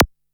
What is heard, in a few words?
DR-660 Perc, Drums, Boss DR-660